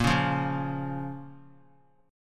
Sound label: Bb Minor; strummed; JGuitar